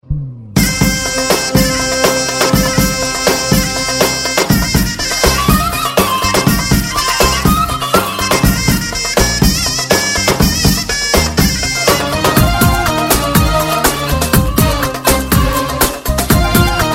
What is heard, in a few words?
Arabic Wed Ringtone